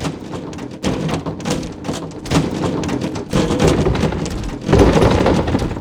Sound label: recall windup; LoL; graves skin05